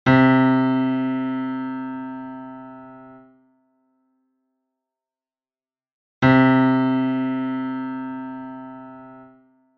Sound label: key c